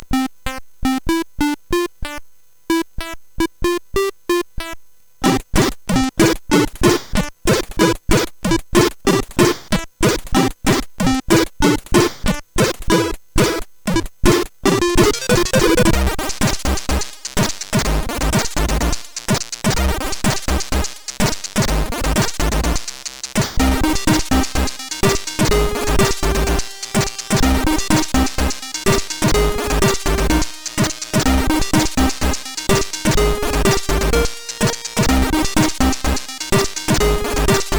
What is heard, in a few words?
Happy bday, CSG8580R5; sid